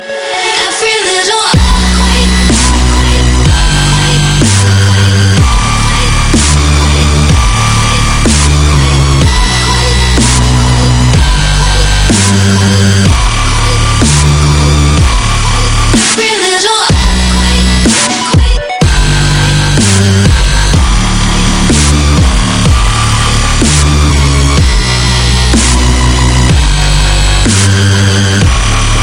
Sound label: dubstep remix